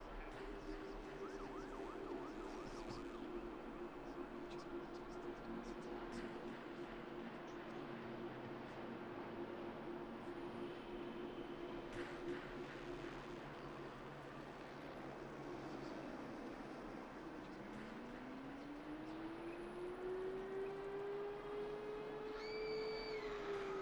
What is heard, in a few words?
Zombies Audio